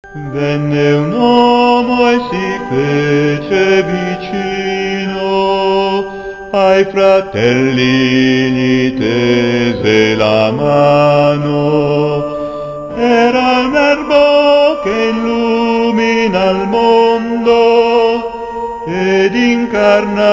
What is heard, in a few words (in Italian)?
bassi